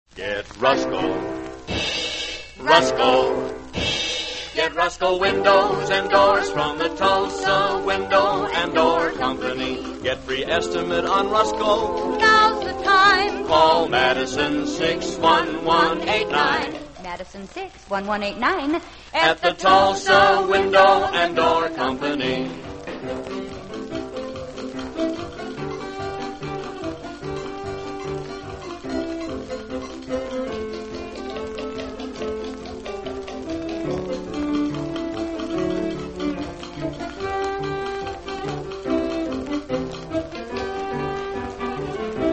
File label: radio jingles; tulsa window and door; allied radio artists